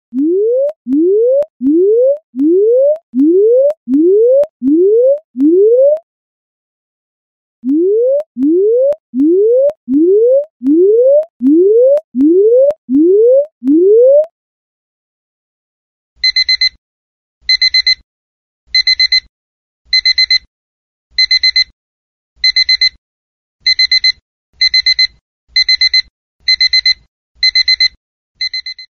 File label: alarm samsung s4 ringtone